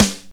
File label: airy snare drum sample g key; snare drum samples